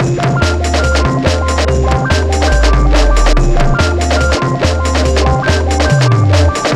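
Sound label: 142 D minor, Hardcore Breakbeat; Big Fish Audio; Hardcore Breakbeat Action; Shake That Booty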